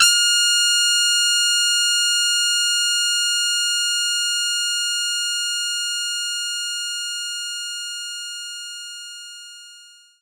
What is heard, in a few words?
Trumpet B5